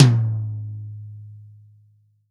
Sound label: REAL DRUMS POP KIT; TOM; Best Service ProSamples vol.43 - Real Drum Kits